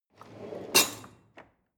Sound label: drawer cutlery draw out